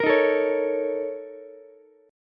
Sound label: Bb Minor Major 7th Double Flat 5th, Ukulele; BbmM7bb5; strummed; 15,15,17,16; JGuitar